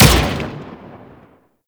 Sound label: Dual Pistol Fire; Warframe; Archwing